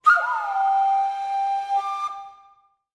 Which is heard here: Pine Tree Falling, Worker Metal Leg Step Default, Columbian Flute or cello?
Columbian Flute